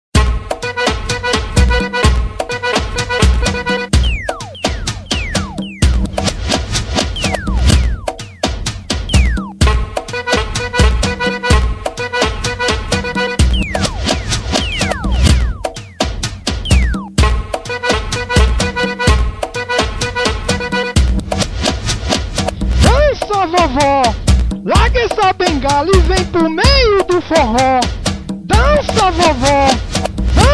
funk; funk novo

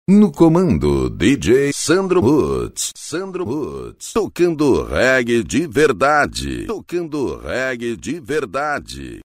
vinheta

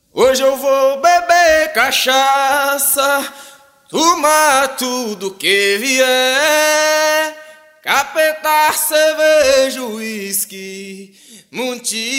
toada

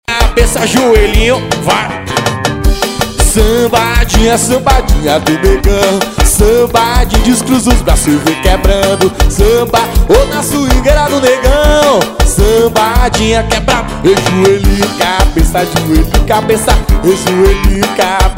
viola das antigas

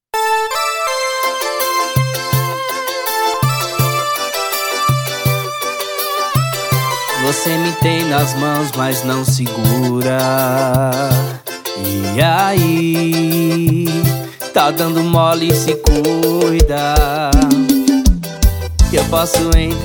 piseiro arrocha

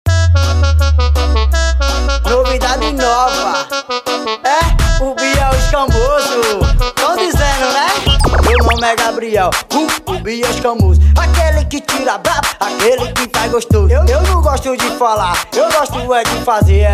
brega

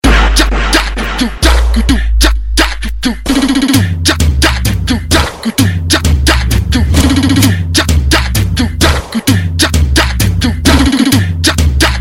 beat lokona mpc

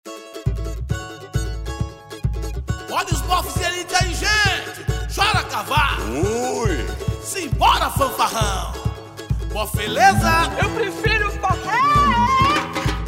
samba enredo

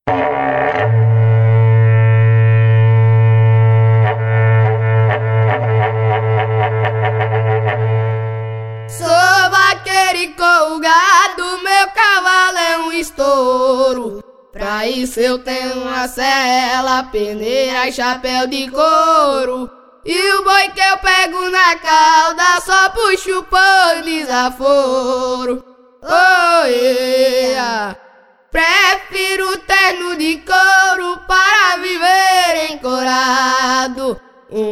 toada

